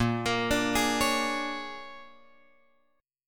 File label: A sharp 7th Sharp 9th, guitar chord; A#7#9 Chord {6 X 8 7 9 9} arpeggiated